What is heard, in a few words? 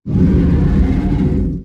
Scorpion Attack